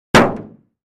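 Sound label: Car Hood Hit Sledge Hammer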